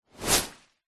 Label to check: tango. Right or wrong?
Wrong